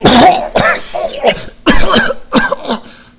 choke

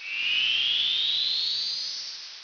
player spawn; vr

